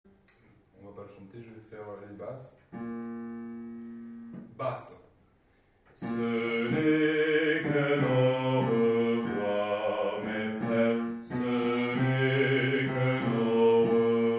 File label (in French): Ce n'est qu'un au-revoir Basses